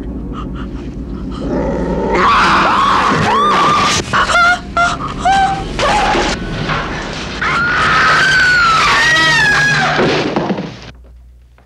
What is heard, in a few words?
Scream